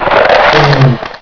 tentacle roar

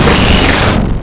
beam start, debris

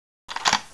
sniper reload second seq, weapons

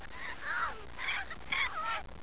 headcrab idle

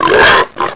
bullchicken pain

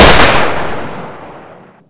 ak47 fire, weapons

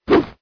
chainsaw miss, weapons

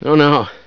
startle, scientist

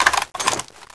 gun pickup

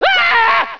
scream, scientist

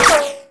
bullet impact

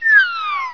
alert, houndeye

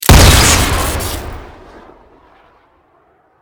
mortar shoot crit; weapons

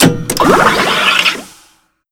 reload; healer

